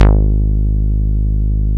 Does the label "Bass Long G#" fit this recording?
Yes